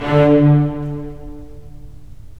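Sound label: STR, strings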